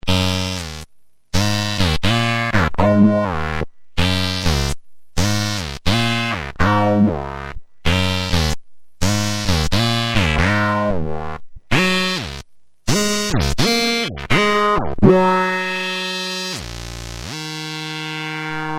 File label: Stereo Solo legato